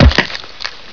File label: bust flesh, debris